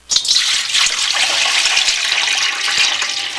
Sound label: Water in the Basin; Soundsation; Nature